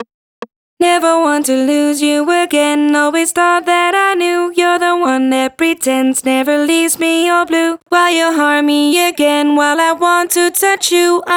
Vocals RETUNE FX, 143,5 BPM; Vengeance Samples; Blue

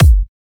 Cutter Kick; Vengeance Samples; Cutter Kit

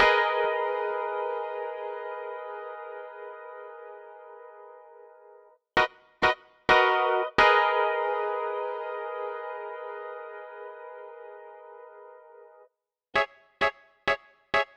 Special Sounds Root G# 130 BPM; Vengeance Samples; VEH3 Special Sounds

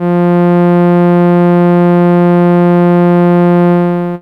Cello F3, ARP Solina; Unmodulated; musicradar-synth-samples

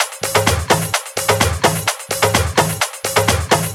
Percussive Loops 128BPM; Vengeance Samples

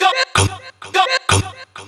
House Loops, Vocal Loops House 128BPM

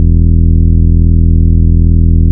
Root E, Bass

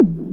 water kick; DnB; Breaks N Beats Sound Kit; DnB Kit 1

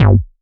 Offbeat Bass G; Vengeance Samples

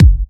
Bassdrum; Vengeance Samples

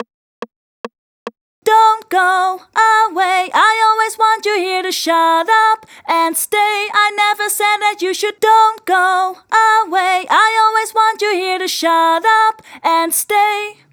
Chorus (Solo), 142 BPM; Vengeance Samples; Hello You

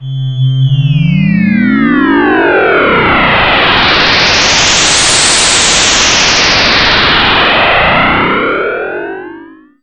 Sweeps Long

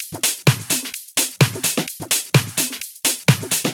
House Loop, 128 BPM; Vengeance Samples

